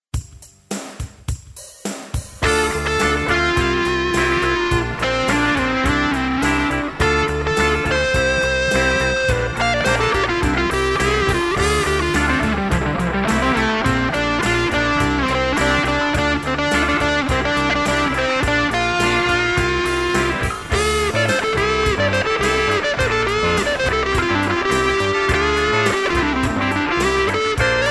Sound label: Hard Rock